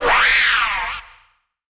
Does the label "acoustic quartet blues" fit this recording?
No